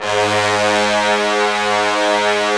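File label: trombones; Roland LA Composer vol. 4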